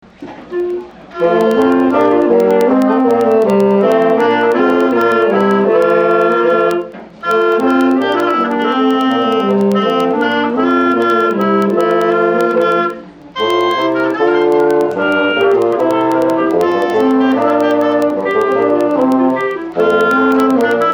Ding Dong

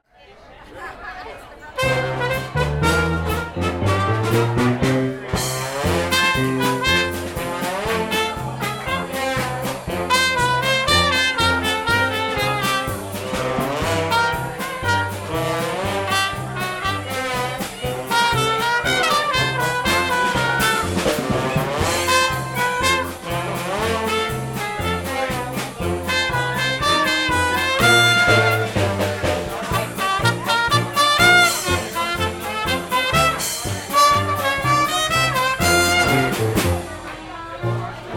polka, Dixieland

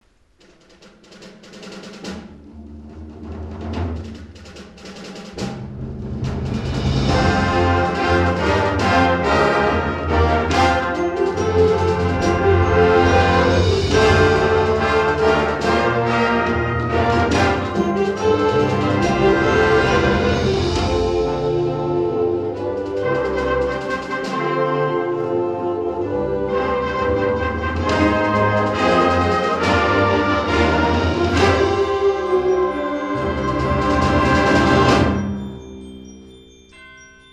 Triumphant Fanfare

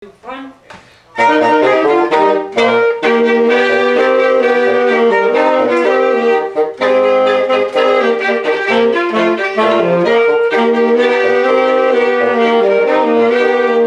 Jingle Bells

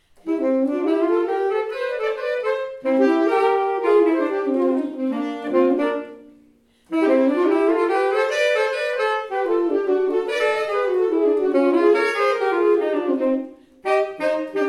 sax duet, recital

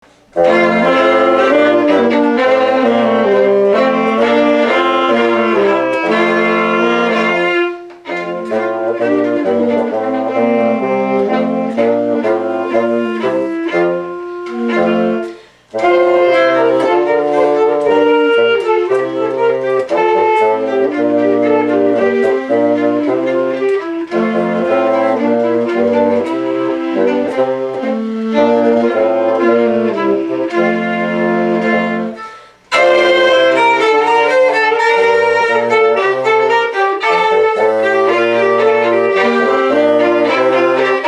Ding Dong

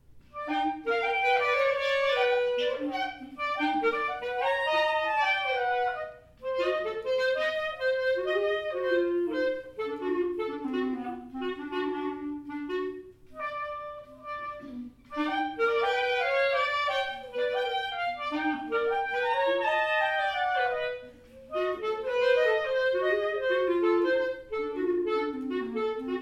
Two Clarinets, recital

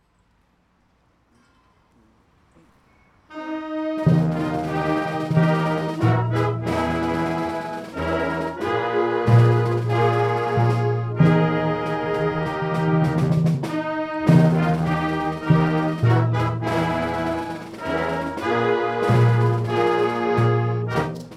Spanish March, Community Band

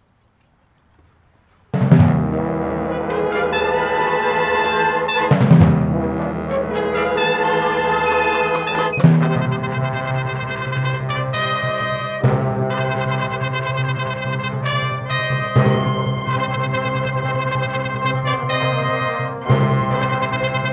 Fanfare

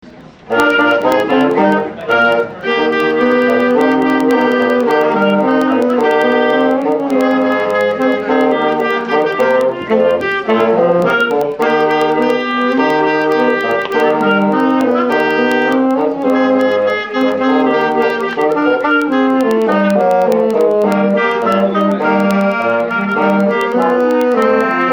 Jingle, Wind in the Reeds

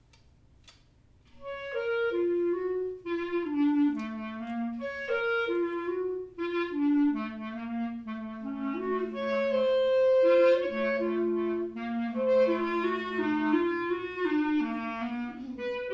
Two Clarinets, recital

